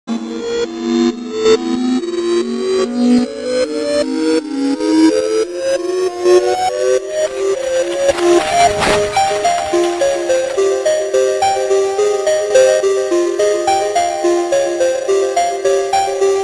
canción para hacer MEWING

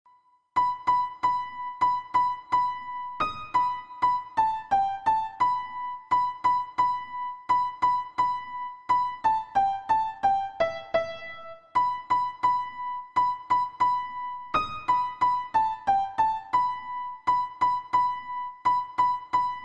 Tono del Juego del Calamar en Perfect Piano

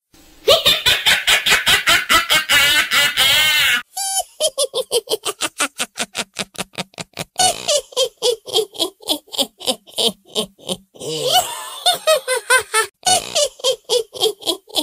Sonido de Risa de bruja Efecto de sonido risa de bruja malvada

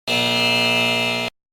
Efecto de sonido Error; buenaudio

